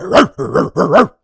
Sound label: so barked up rn